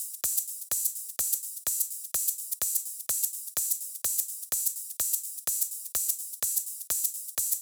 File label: Thai Kit 6, 126BPM; Vengeance Samples